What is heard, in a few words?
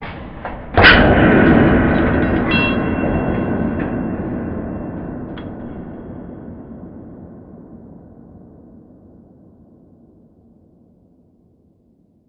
big hit far